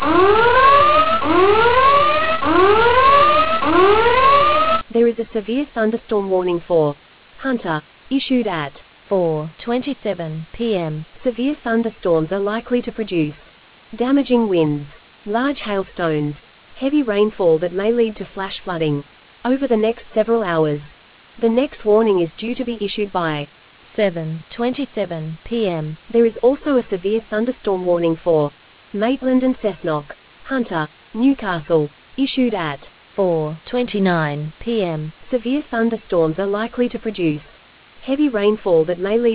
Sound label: storm audio